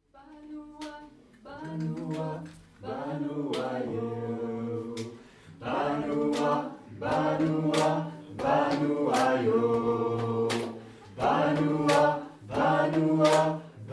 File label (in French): chant